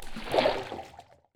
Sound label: water exit